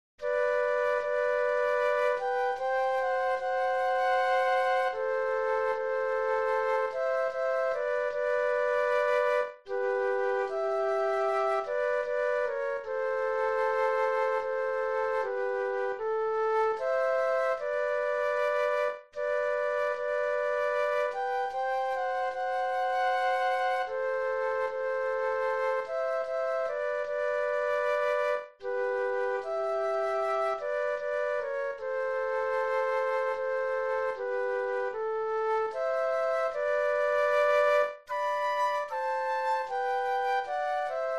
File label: flute duet